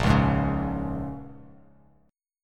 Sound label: Bb Minor 7th, Bbm7 chord; Bbm7 strummed